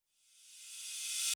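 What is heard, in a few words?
Reverse Crash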